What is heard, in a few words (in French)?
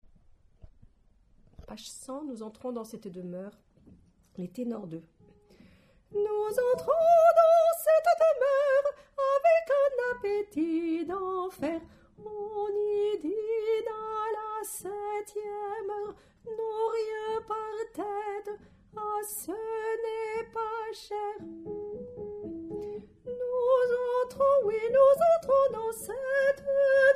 Tenor; Nous entrons dans cette demeure....